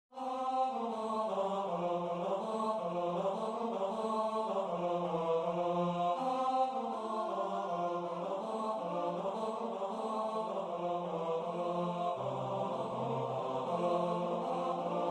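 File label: Deck the hall bass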